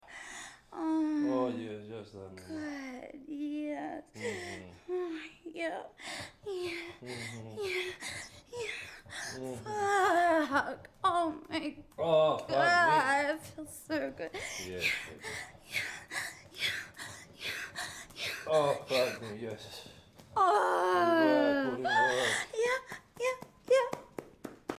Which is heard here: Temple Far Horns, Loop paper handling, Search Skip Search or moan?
moan